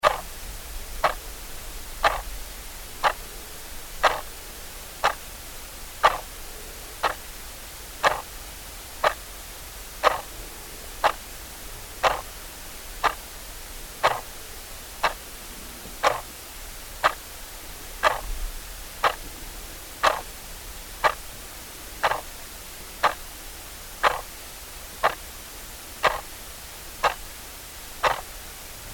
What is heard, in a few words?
Clock Tick; Very close hart beat type ticking - electric clock; Clocks; Machines; Tom Hutchings